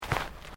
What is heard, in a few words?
FOOTSTEPS SNEAKERS GRASS STEP; Footstep Foley; Human